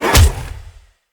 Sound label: Melee Heavy Attack Slam; Whooshes; Jump Slam